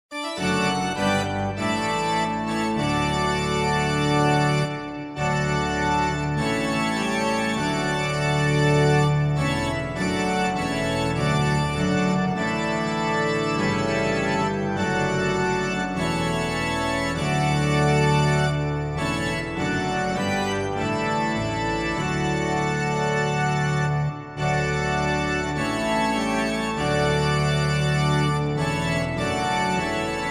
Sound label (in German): Trumpet Voluntary nur Begleitung